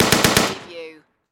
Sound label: Machine Gun STG44